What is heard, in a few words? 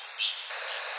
Unknown and Dickcissel Full Speed